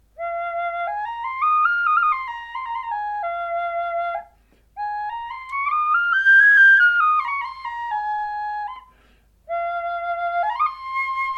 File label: single F5 Western Cedar, flutes